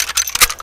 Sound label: m249 chain, weapons